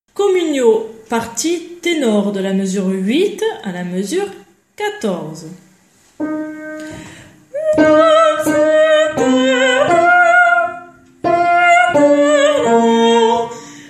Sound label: Tenor; Requiem; Lux Aeterna; Mozart